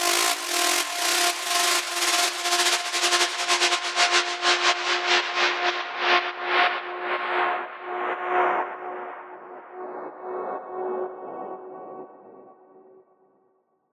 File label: Sweep Down